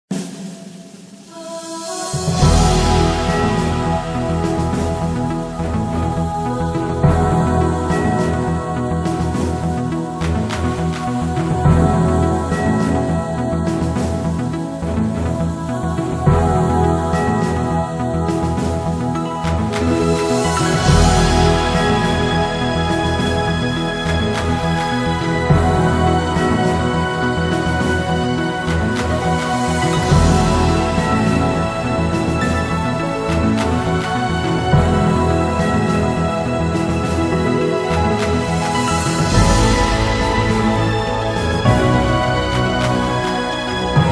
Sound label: music